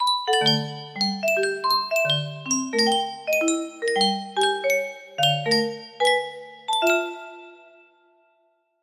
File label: wind charms, music box melody